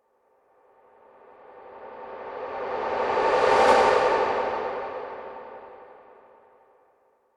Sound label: Whoosh Transition Airy Dreamy Slow Build; Science Fiction Horror; Whooshes (Sound Effects:Sound Design)